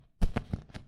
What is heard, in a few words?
dirt bag step